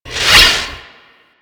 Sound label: Space Pod Rocket By; Grineer; Vehicles; Warframe